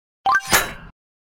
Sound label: shield pick up; pixel gun 3d